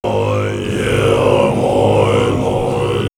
Monks, vox; Bardo Thödol; X-Static Goldmine; vox mix

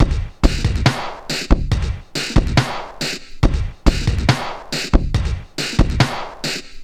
SloMo Beats, Loops 140 BPM

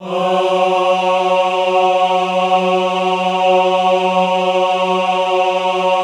AHH F2, choir; Best Service; Extended Classical Choir; AHH MIX

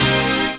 HOUSE, House Dance

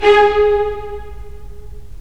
STR, Full Short; Roland - LA Composer vol. 4

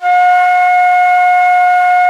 F#4 FLUTE; AKAI S-Series CD-ROM Sound Library VOL-7

